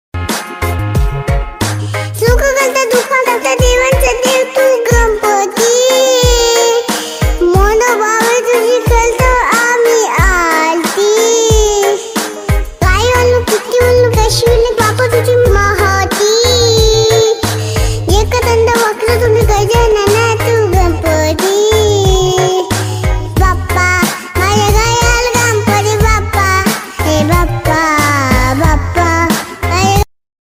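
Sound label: flute music ringtone instrumental ringtone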